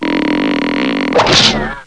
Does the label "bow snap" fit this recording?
Yes